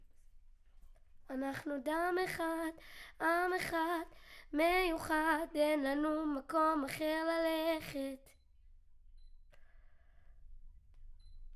Children Choir; Studio Bekol Children Choir

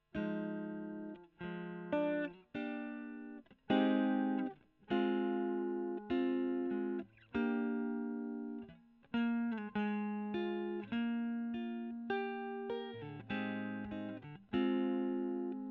E. GTR Gefell

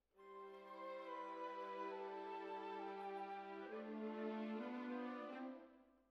Cellos Over

